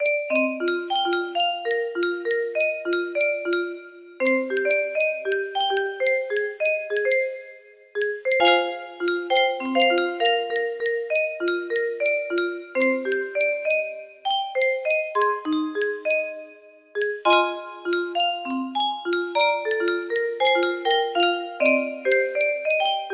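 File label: noodling in A minor, music box melody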